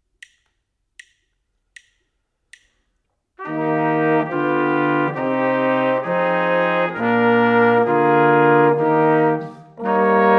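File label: harmonic dictation, brass quartet; eartraining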